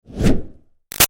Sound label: Fire Torch Whoosh Med; Flame Sound Effects; Objects